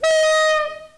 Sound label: Klaxon, Train; OpenBVE